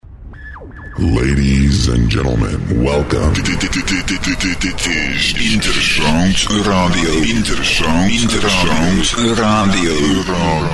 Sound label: jingle; intersound; Pirates for Peace Movement; Broadcasting Love.. Peace.. & Good Music / Pirates for Peace